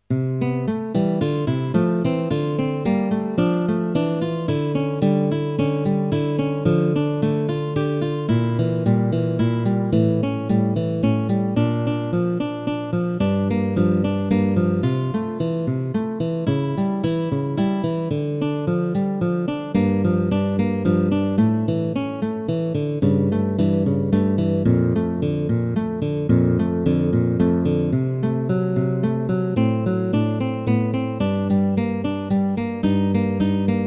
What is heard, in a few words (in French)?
guitare